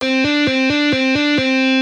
Hammer ons, guitar

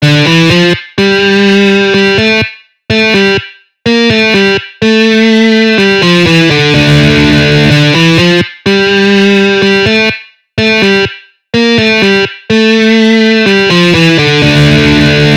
Metal guitar riffs

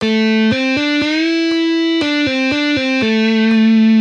Easy guitar licks

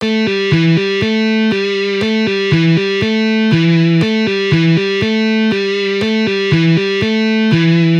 Easy Guitar Riffs

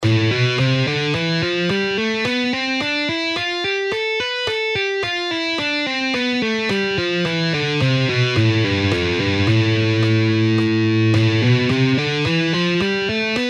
Guitar fretboard exercises

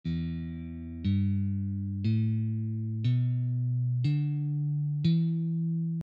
Beginner guitar scales

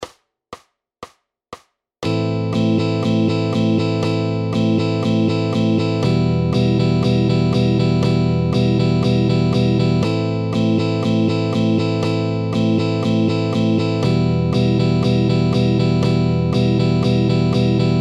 Rhythm guitar exercises

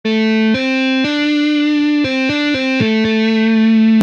Guitar licks

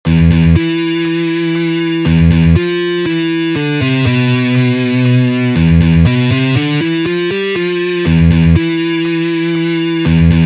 Electric guitar riffs

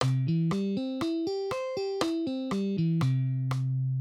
Arpeggios on guitar

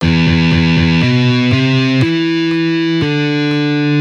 Heavy Metal guitar

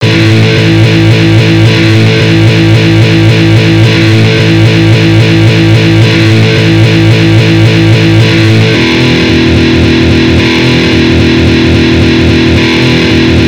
Guitar solos riff

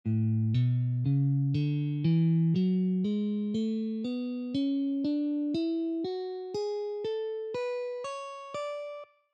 Guitar notes

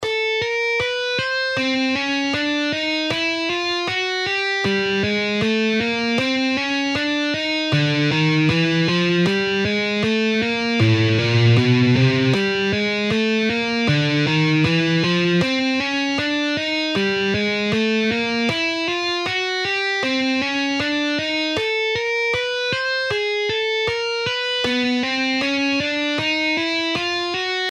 guitar finger exercises

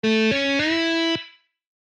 Guitar scale exercises

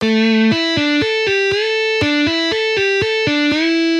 String skipping, guitar

